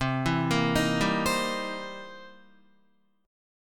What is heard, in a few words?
C 7th Sharp 9th C Guitar; arpeggiated; JGuitar